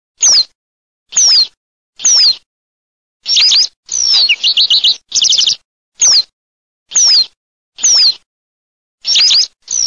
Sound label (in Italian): MIGLIARINO